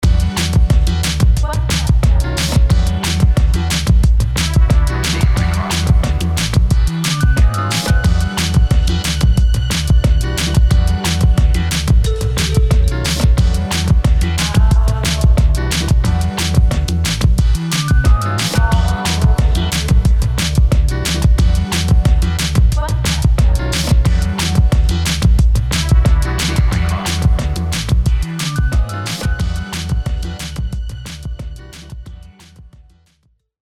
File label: Abstract HipHop; E-Lab; e-LAB MP3 Demos